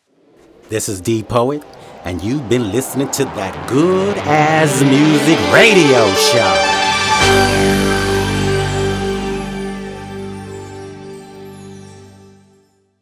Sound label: Radio Show Intro